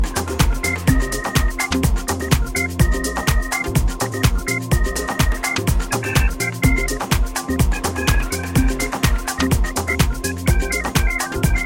ORGAN

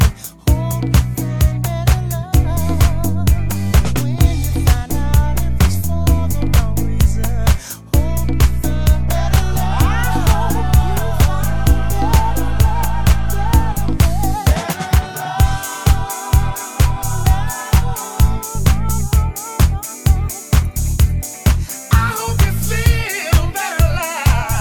Vocal

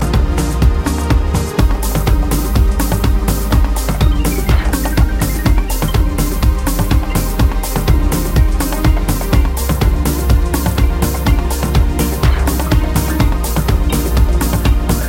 ALT INST

